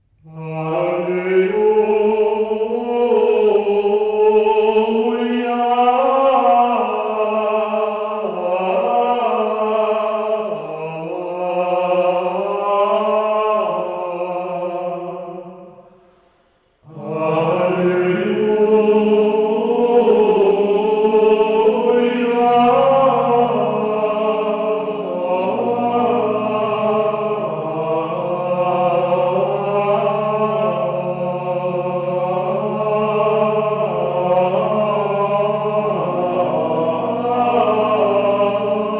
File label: Alleluia SOLO; Dominus regnavit; octava Navidad; Dic 25 Natividad